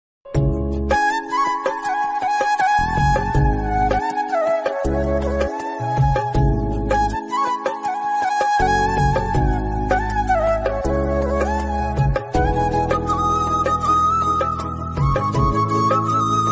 tamil love bgm flute ringtone

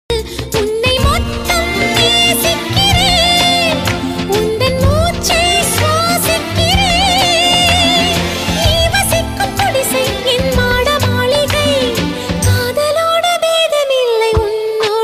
tamil song; tamilnadu chennai

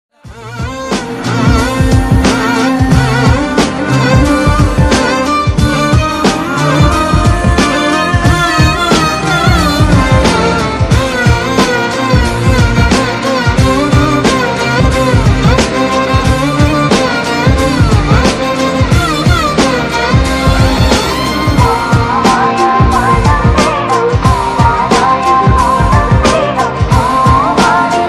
naan sirithal bgm song ringtone

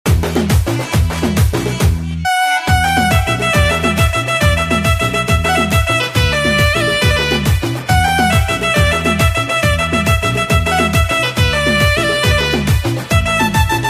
song on akai mini instrumental ringtone; pushpa 2; rashmika mandanna

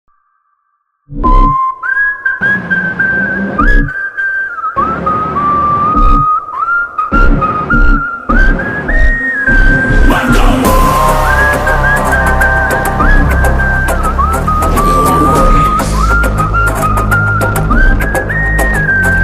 theme bgm ringtone; marco

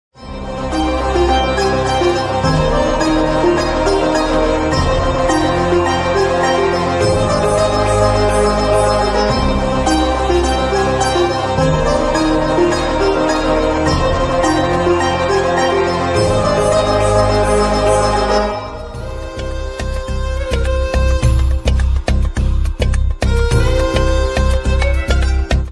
bgm ringtone instrumental bgm

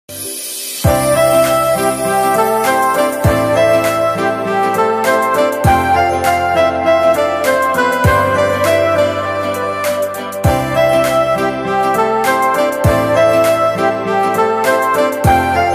mobile ringtone, bgm instrumental ringtone

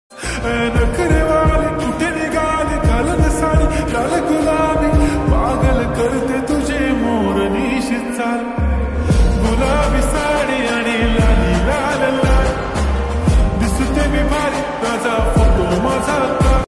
marathi songs

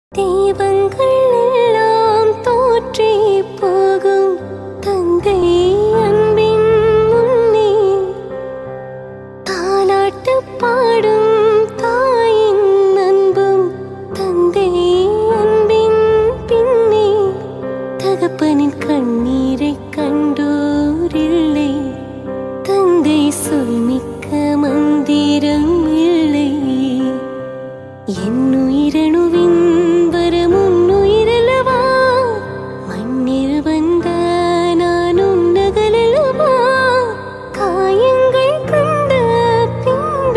song female version tamil

